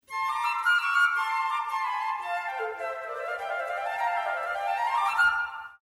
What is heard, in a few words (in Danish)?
Floejter; Træblæsere; Fløjte